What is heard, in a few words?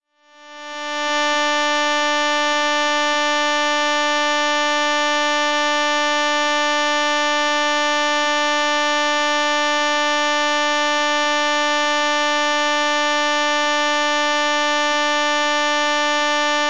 violin D 3rd